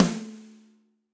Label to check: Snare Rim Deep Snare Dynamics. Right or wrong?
Right